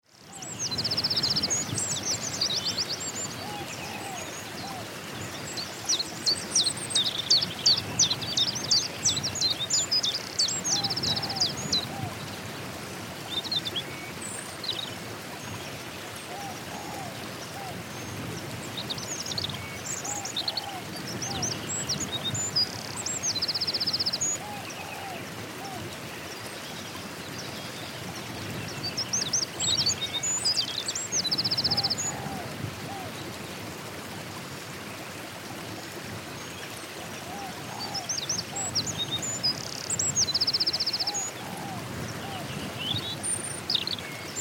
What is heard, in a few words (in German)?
Entspannungsmusik